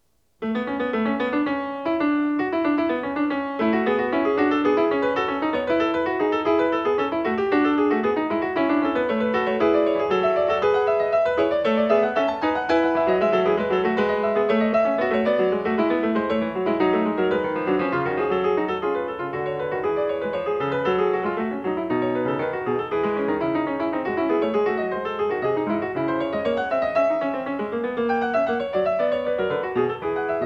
Fugue No. 19 in A major, Bach, Well-Tempered Clavier; BWV888